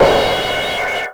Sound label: CRASH, Drums Dance & Groove